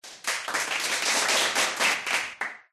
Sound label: Applause; ZhenShiXunYouJi2